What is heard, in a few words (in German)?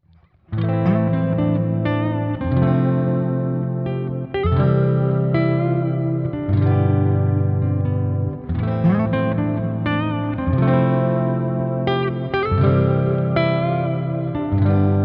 Umgekehrte Hitkadenz